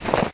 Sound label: snow footstep; nalc game